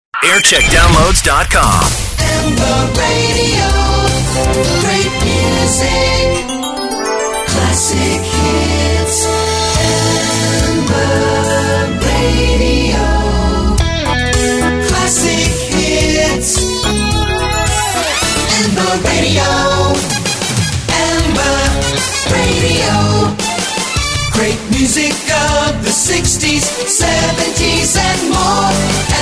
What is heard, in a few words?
jingles; amber radio